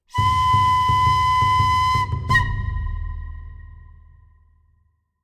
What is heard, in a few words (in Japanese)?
篠笛と太鼓